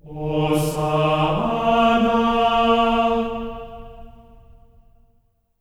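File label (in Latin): hosanna D#4; Spectrasonics; Symphony of Voices; GR HOSANA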